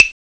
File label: CLAVES, CMI